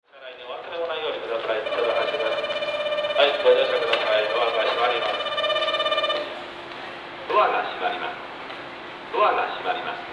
tsuruhashi bell; jrwest

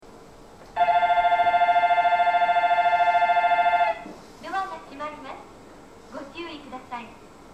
minamitama bell; jreast

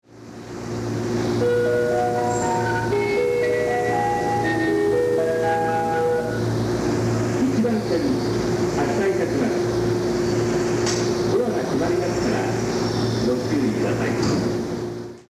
yono melody, station; jreast

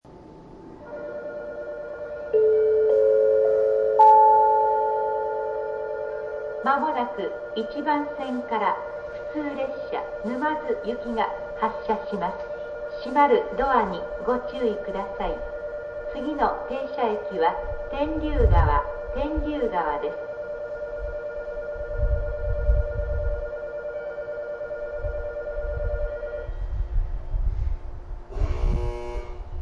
bell

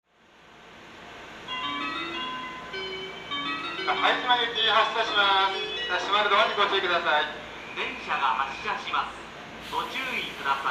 kamishakujii melody, station; 1番線発車メロディ; seibu

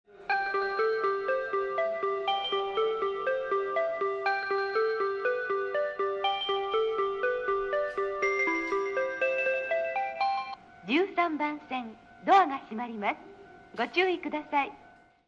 melody, station

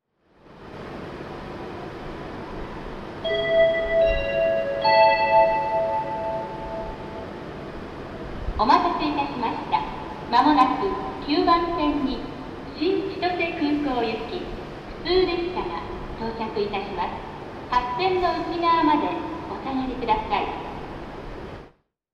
sapporo approach; jrhokkaido

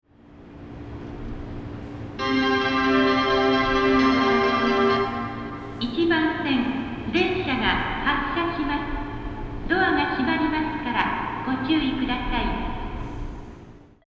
etchujima melody; jreast

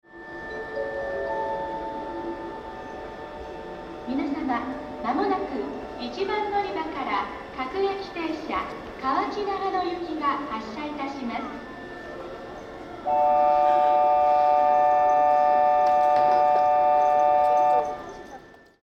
bell, station; nankai; namba

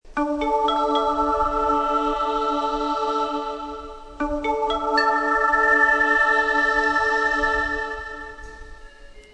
namboku melody, station; eidan

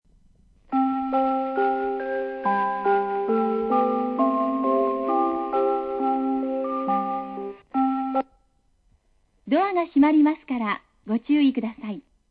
melody, station

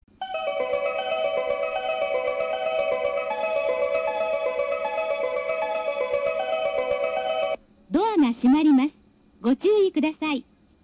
melody, station

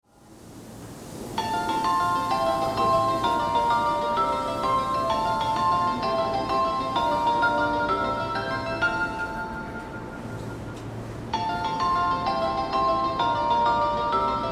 minamifunabashi melody; jreast

